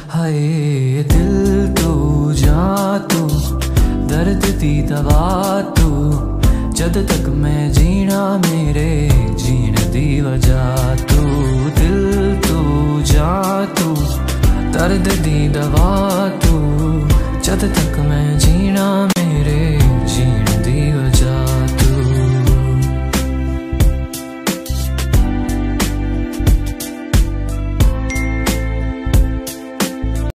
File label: PANJABI SONG RINGTONE